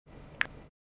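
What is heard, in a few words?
Click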